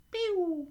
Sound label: enemy shot